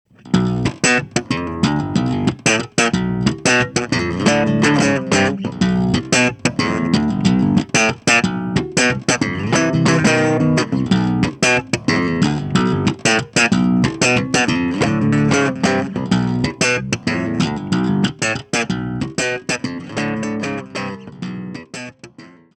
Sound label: Blackstar FLY 3 Bass Slap